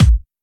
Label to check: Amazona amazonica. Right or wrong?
Wrong